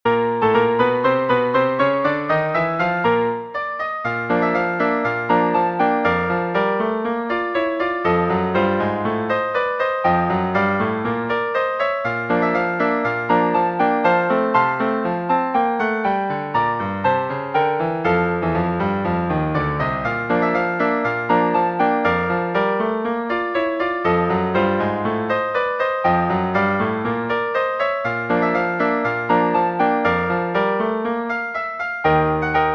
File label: battle